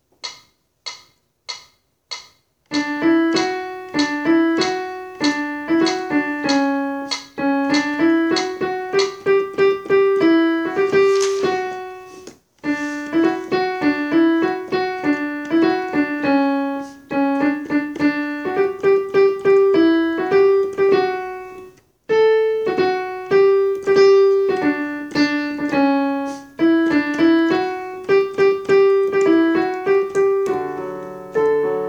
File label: Alto; Nelly Bly